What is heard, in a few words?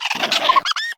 Wolpertinger Attack